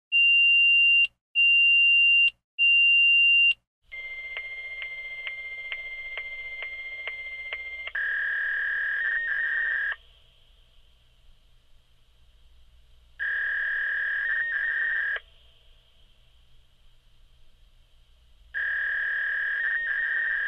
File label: fax machine